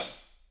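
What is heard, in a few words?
HH, hi-hat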